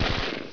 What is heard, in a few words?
GUN; LEDWARS